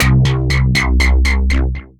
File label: Bass; Absolute Filth; Ableton; Skitter and Step